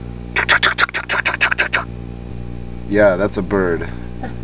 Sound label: fence